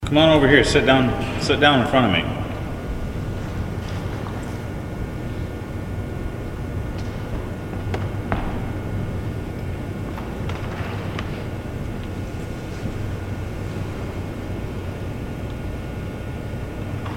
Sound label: evp; queen mary long beach; wheelock